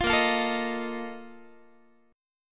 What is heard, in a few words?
Bb Major 7th Suspended 4th, Guitar